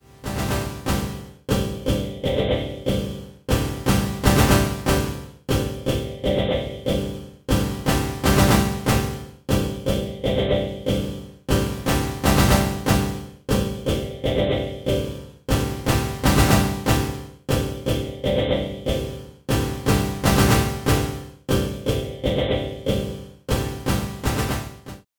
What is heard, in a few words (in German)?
Rossum Electro Music Morpheus Preset Seq ohne CV